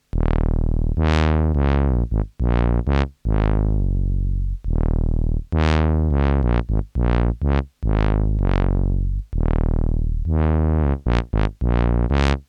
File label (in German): Teisco S100P TUBA mit Brilliance Wow